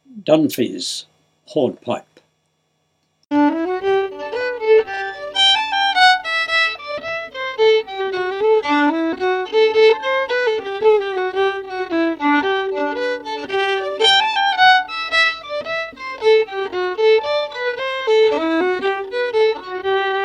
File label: Hornpipe 100bpm; Dunphys